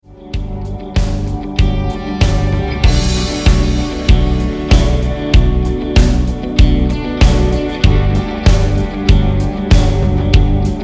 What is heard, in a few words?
rhythm